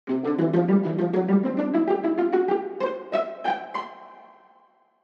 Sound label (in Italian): VIOLE pizz